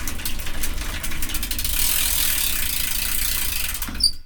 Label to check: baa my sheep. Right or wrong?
Wrong